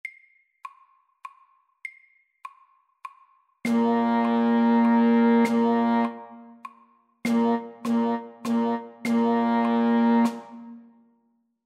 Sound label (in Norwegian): Rytme 3 slag i takten på slaget MIDDELS